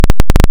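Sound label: waveform FM sawtooth sine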